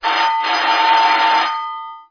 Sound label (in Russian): dvernoj zvonok iz proshlogo